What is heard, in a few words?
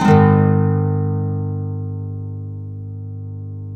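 nylon guitar up major A, flam chords; Spectrasonics; Hans Zimmer Guitars VOL-2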